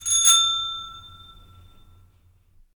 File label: bycicle bell